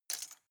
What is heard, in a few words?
cutlery plastic drawer place